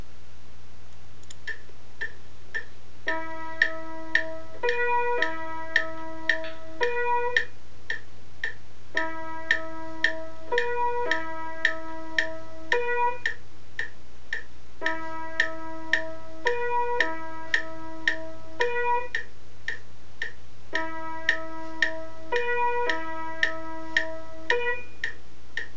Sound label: music CW